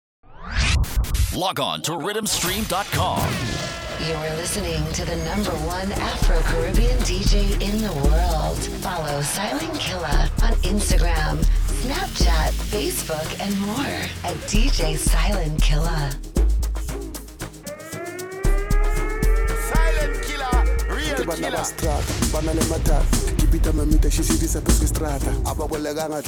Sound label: amapiano